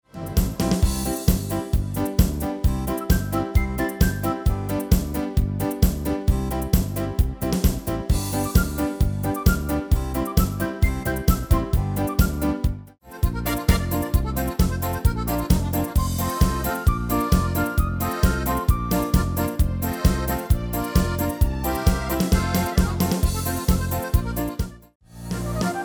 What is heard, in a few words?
MIDI